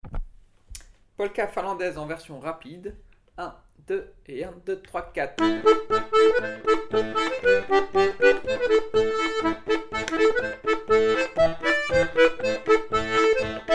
polka finlandaise rapide

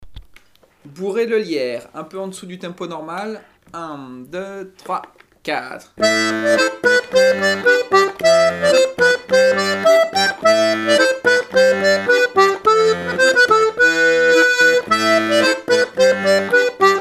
bourree

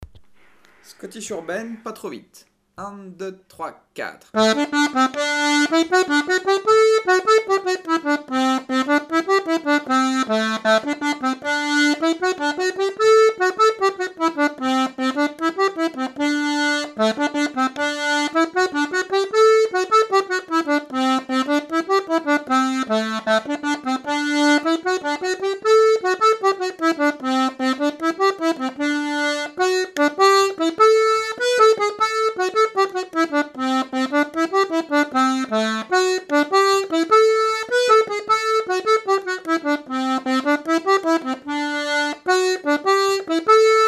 scottish urbaine lent; ateliers diato 2; L'atelier de la casserole

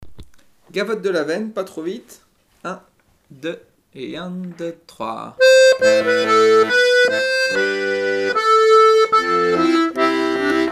gavotte de l aven

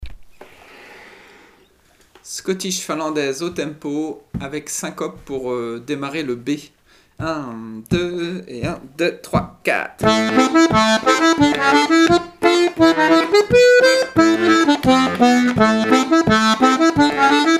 scottish finlandaise vite

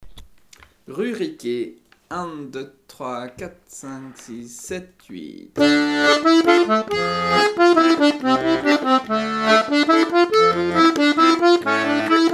valse

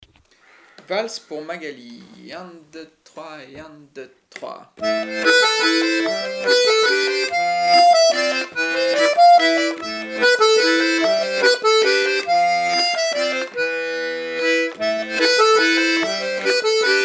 Valse

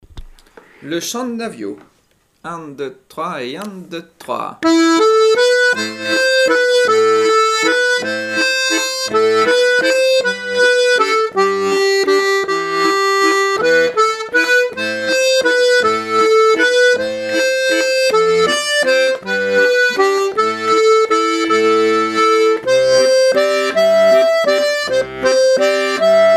lent

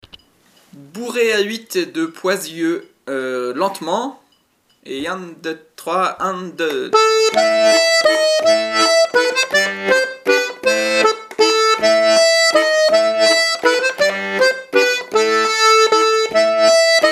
bourree a huit de poisieux lent

